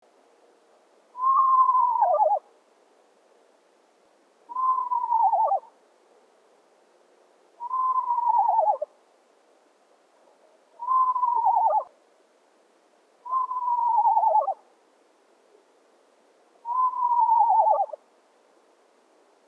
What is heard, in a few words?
Screech Owl